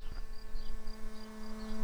bumblebees, Hypnorum workers

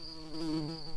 bumblebees, Lucorum queens

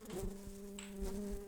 Humilis workers, bumblebees

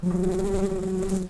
Pascuorum workers, bumblebees

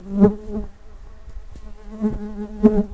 Pascuorum workers, bumblebees

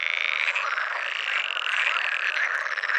Pool-Frog, frogs

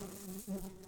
Humilis workers, bumblebees

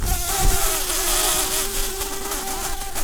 bumblebees, Pascuorum queens

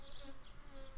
Recording, bumblebees, Sylvarum workers

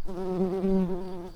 bumblebees, Hypnorum workers

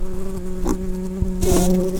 Lapidarius queens, bumblebees

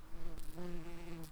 Lapidarius workers, bumblebees

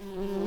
bumblebees, Hypnorum workers

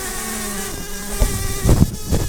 bumblebees, Pascuorum queens

